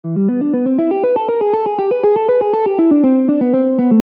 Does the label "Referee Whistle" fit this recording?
No